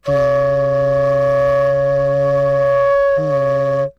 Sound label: FL HUM C#5, FLUTE HUM; Greytsounds - Philip Wolfe David Crozier's Saxophones